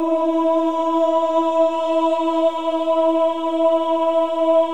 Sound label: OH E4, CHOIR OHS; Spectrasonics; Symphony of Voices